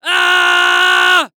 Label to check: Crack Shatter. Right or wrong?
Wrong